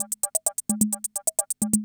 CR78

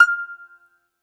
BAGLAMA F0VR; E-MU Producer Series Vol. 7 – Old World Instruments

